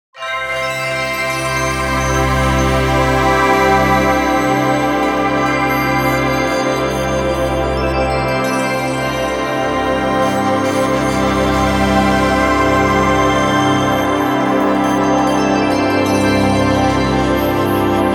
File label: Bed Crystal Swells Shimmer Twinkle Bursts; Production Element Imaging Element Accent Transition; 5.1 STEREO MIX; Blastwave